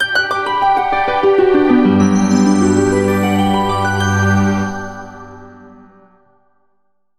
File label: Jingle; TOHOKU